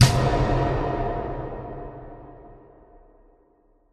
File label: paw